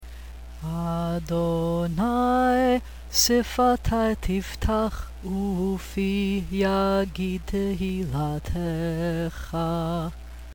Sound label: Sfatai tiftakh sung; Bnai Mitzvah Requirements; Service Practice